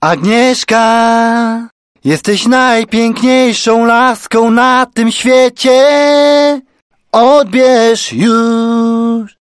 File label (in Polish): Milosna Piosenka